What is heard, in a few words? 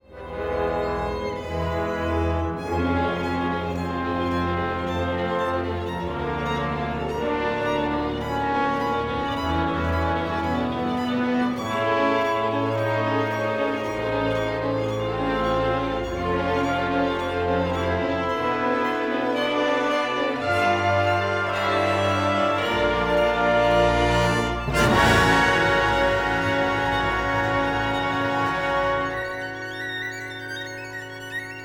Violin Concerto snippet; Matheson; sonorus holographic imaging